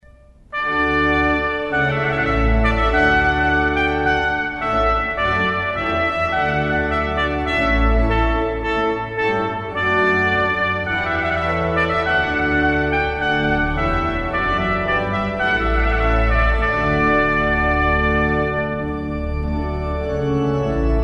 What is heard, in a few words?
Trumpet Voluntary